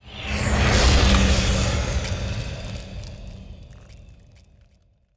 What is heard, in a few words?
pro ship mauler move; biogenesis